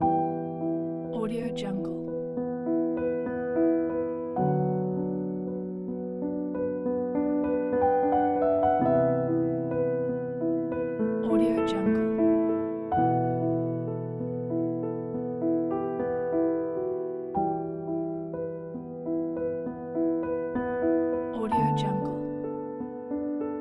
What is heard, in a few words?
sorrow